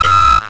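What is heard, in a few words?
droid probe hit; Star Wars Knights Of The Old Republic II; XBOX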